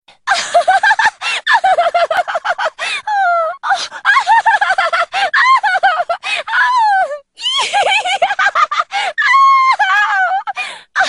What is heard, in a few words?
girl laugh